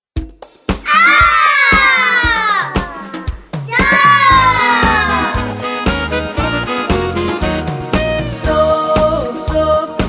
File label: niggun